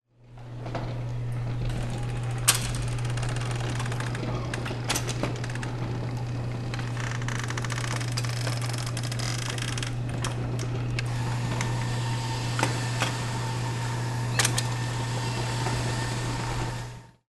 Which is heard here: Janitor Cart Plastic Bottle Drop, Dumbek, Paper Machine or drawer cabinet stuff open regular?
Paper Machine